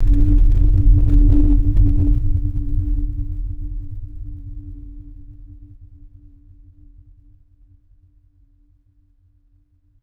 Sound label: deep synthetic low frequency pulse fading; designed